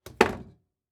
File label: Household Trimmer Set Down Electric Hair Trimmer